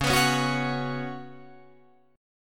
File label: Db 7th Suspended 4th Db down Guitar Drop D